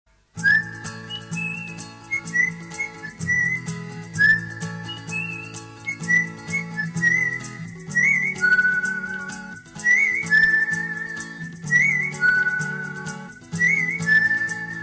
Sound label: Beautiful Whistle